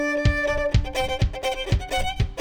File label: kick